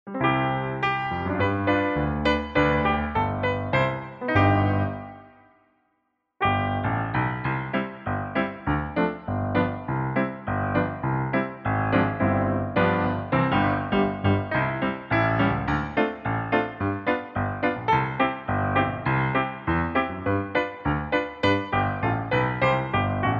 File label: piano play